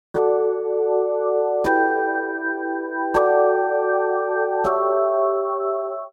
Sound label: micro prism pad